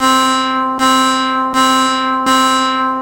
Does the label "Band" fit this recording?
No